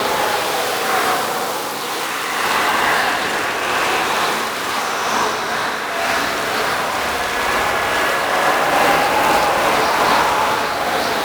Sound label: Sand Vortex Loop; Ambience; Gameplay; Warframe; Entrati